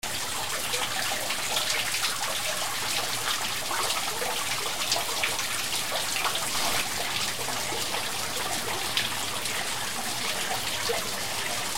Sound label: atmo waterfall flowing and light splashes